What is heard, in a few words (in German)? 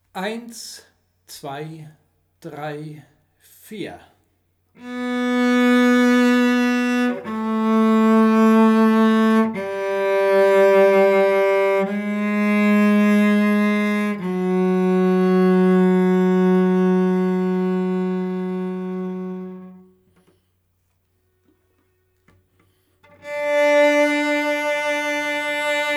Stimme B; Burbach; Vivaldi